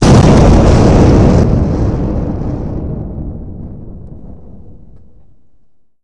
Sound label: big explosion, Weapons; Subsurface; Barotrauma